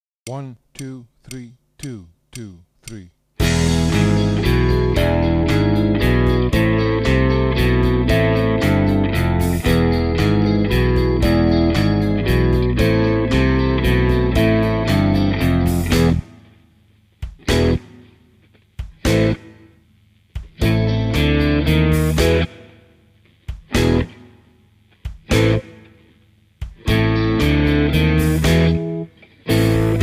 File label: Malaqueсa Rock - Backing for Lead, Rock Guitar; Play Rock Guitar - Ed Wennink